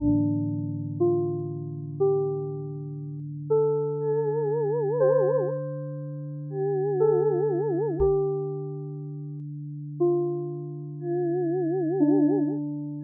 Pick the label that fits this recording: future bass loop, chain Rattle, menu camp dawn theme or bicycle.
menu camp dawn theme